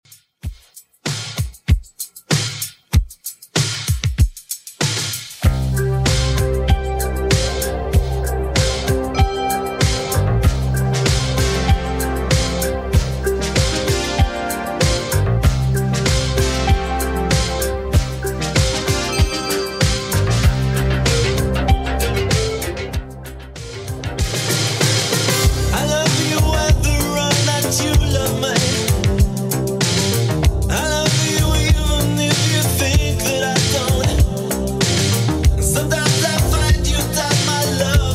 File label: 80s Redrum 98bpm